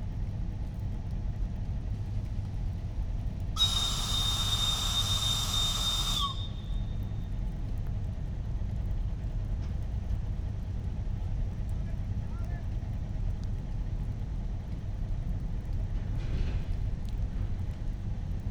egy személykocsival induló villanymozdony

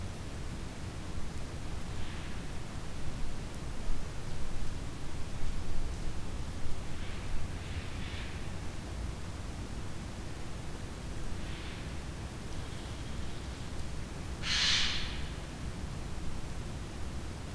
távolról majd közelről, szajkó; allathangok; termeszetben; Aggteleki-karszt

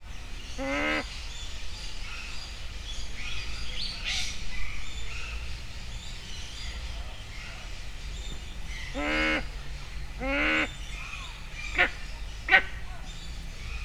lassacskán belejön, parlagi sas; debreceni zoo 2012

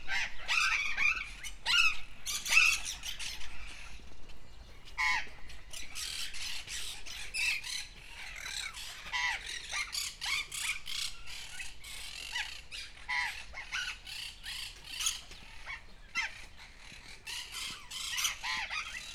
némelyikük hangosabban, sziklapapagáj; debreceni zoo 2012

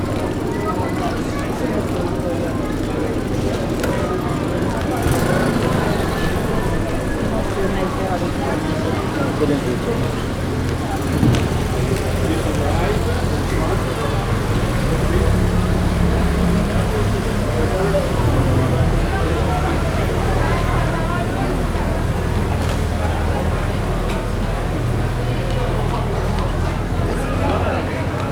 balra hid, kozepes csatorna, laguna; olaszorszag velence 2014

